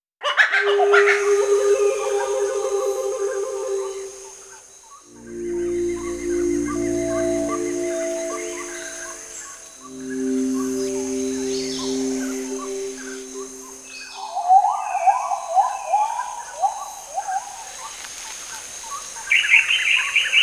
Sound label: rainforest; Forêt Tropical